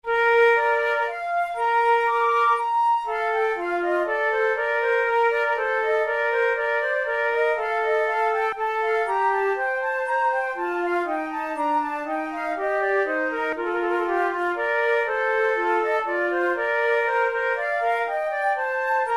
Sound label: prelude, flute